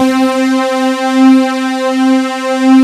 D50 OBX, ROLAND D-50; Rubber Chicken Software Sample Bank

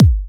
kick machine

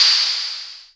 hats, drum machines, FutureRetro FR777

